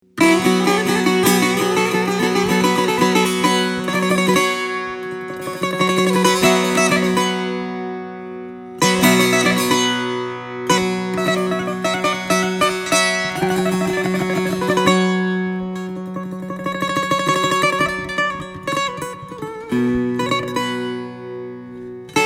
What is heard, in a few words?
Taknavaziye Setar